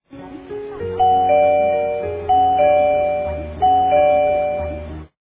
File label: ding dong, doorbell